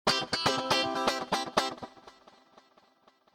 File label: normal win, American Poker; American Poker V; wazdan